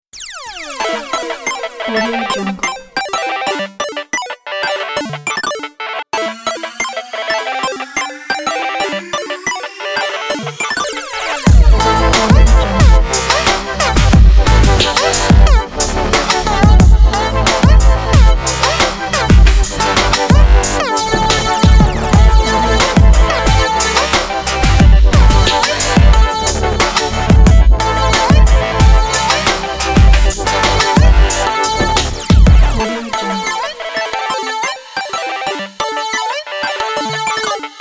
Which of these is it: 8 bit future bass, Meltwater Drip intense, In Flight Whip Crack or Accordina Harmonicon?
8 bit future bass